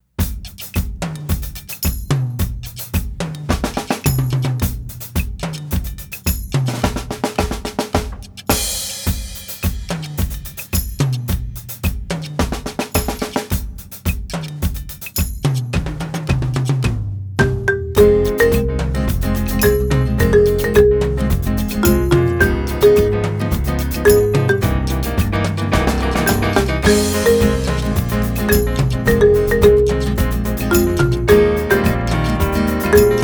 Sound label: Samba